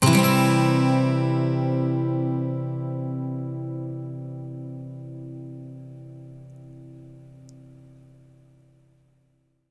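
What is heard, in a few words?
12 string major C, Electric & Acoustic Guitar Loops; USB Soundscan vol.04; AKAI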